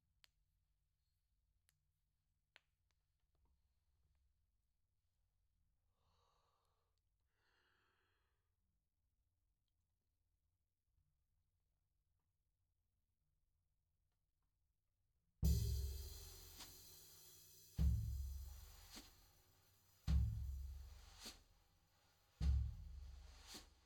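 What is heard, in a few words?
floor tom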